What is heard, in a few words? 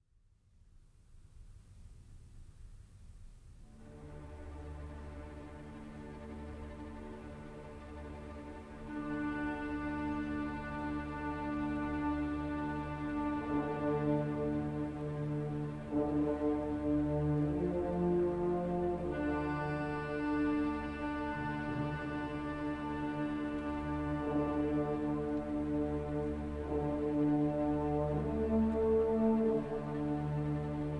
Symphony In D Minor Feierlich Misterioso